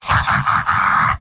m laugh happy; heavy; tf2; garrysmod; mvm

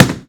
boxing gloves hit; tf2

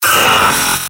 scout pain severe; vo; tf2; mvm

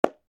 clap; pyro

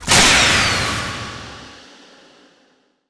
powerup pickup reflect reflect damage; Garry's Mod; tf2

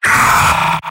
sniper pain severe; vo; tf2; mvm

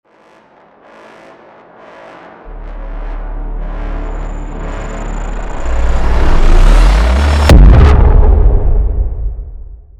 Sun Jumpscare; sun wake up

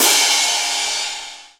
hitsound percussion

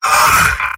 medic pain sharp, mvm; tf2; garrysmod

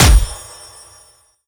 item crate smash rare

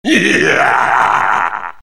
knight laugh; vo; tf2; garrysmod; halloween boss

